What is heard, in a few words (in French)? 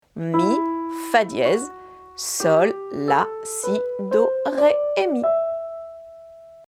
gamme mi mineur